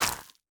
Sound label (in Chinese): 根破坏声